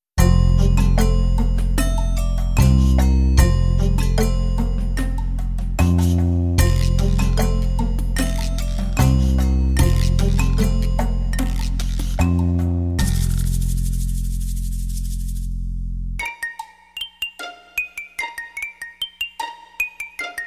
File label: Instrumental